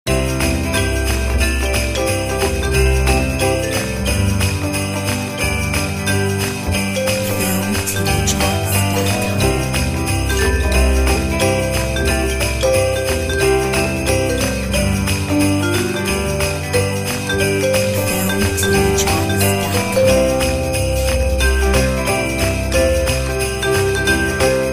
Christmas Swing

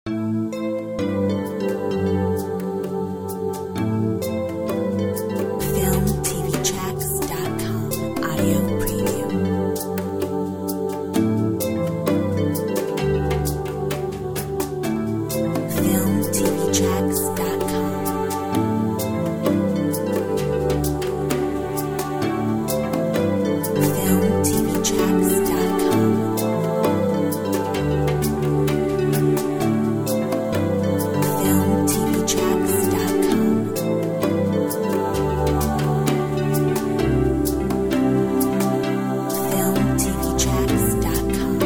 Ethereal Harp

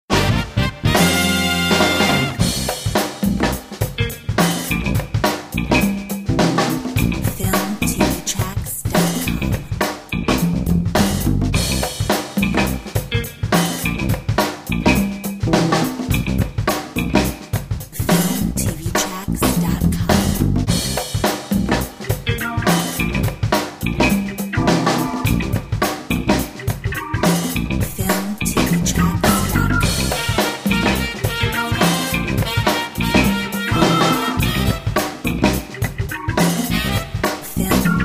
Funky Donkey

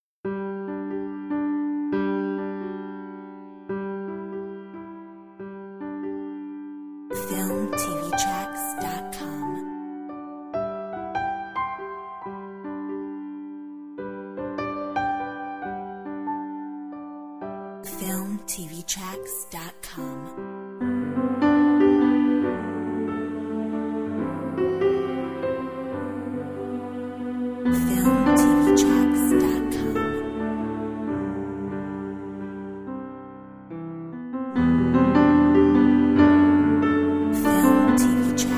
Peaceful heart choir

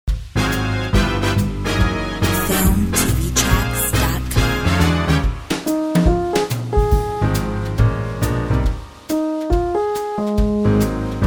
Swing

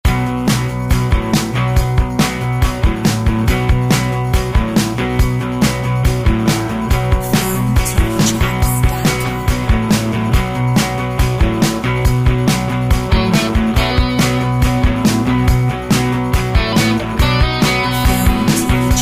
Gimme Guitars

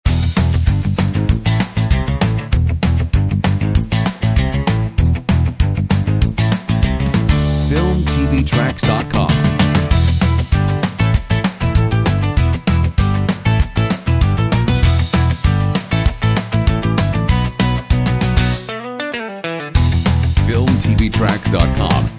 POP ROCK PARTY; comedy funny

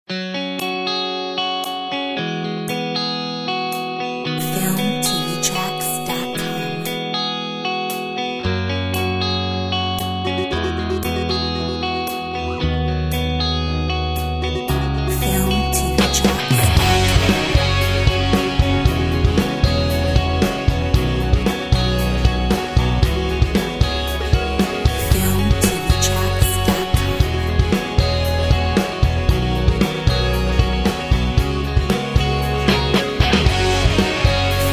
Independent rock

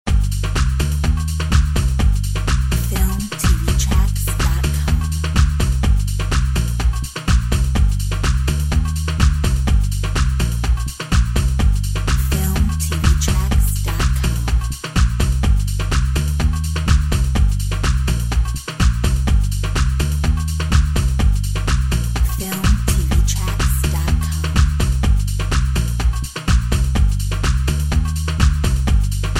Drum bass; Filmtv-tracks

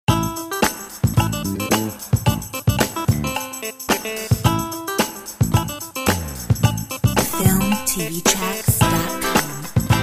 Funk style

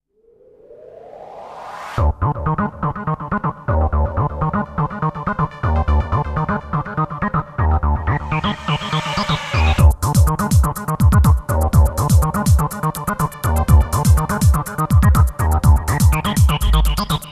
High tech

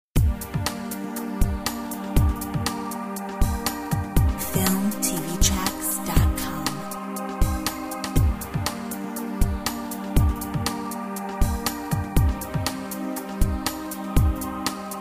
Corporate

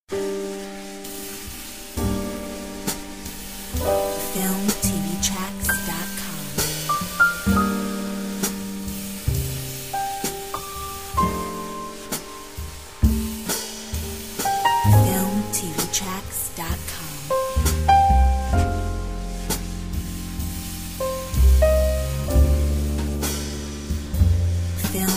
Bluesy day

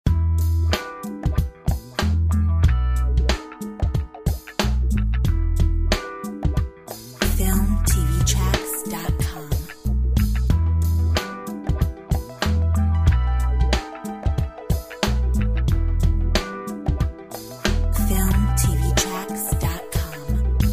Motown

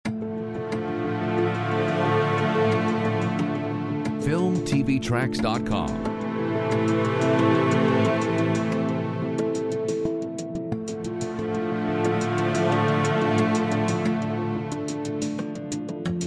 drums

